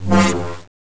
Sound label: acid shield; opensurge